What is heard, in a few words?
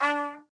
D Trumpet